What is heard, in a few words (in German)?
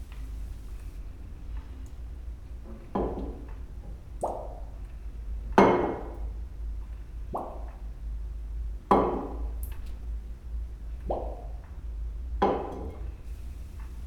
Glucksende Flasche, Brasilien